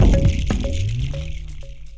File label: Mus Village Build 120; From Dust; ps3; xbox 360